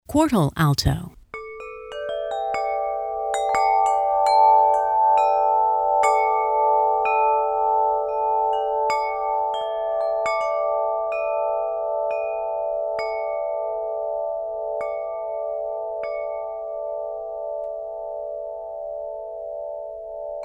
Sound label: Quartal Alto